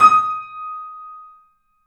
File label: D#, The Piano; InVision Interactive - The Piano